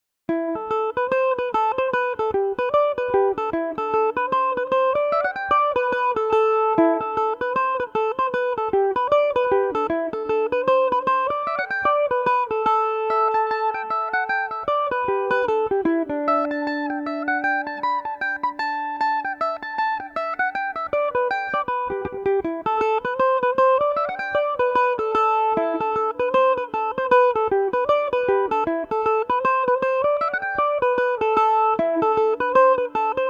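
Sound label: A dorian, irish mandolin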